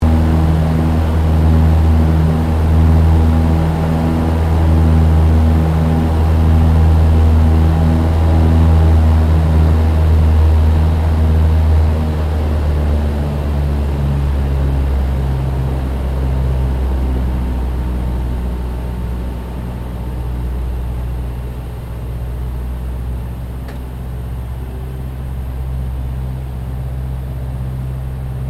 AIRCRAFT LOCKHEED HUDSON APPROACH INTERIOR, Hudson twin engine light bomber; 1941; Airplanes; Vehicles